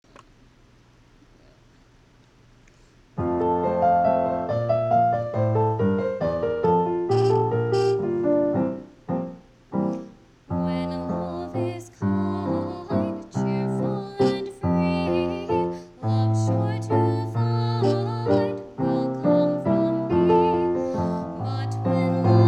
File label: mezzo alto; when love is kind